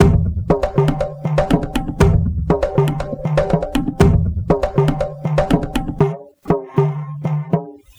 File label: Kadu Tabla Beat, Acoustic Beats; Sonic Foundry (Sony Creative Software); Myths of Technology